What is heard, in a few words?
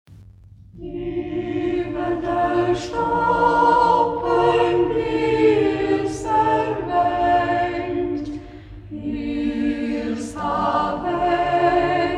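kammerchor, Schässburg; Ortsmundart: Schäßburg; Iwwer de Ståpple (Uëwschied); Schäßburger Kammerchor; Hegt wird gesangen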